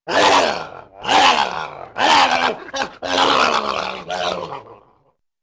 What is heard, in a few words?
Funny Upset Dog Grow